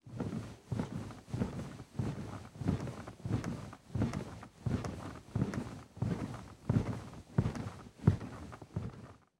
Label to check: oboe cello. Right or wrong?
Wrong